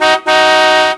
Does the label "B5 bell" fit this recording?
No